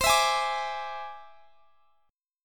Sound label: E Augmented 7th C